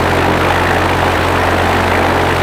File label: Plane; Vehicles